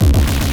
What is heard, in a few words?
D Bass One Shot